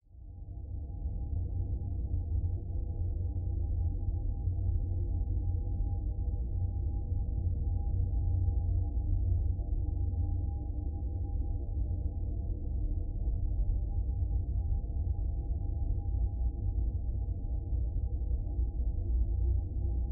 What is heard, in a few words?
ambience deep drone large haunting dynamic overtones actually filtered self